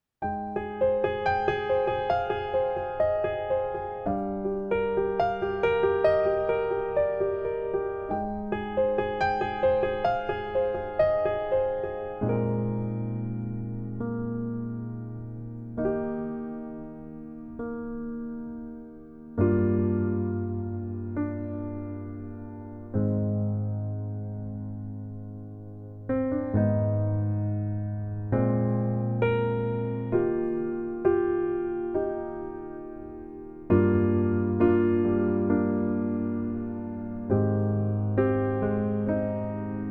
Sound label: Rain (Inst.); Lovers in Bloom; Korea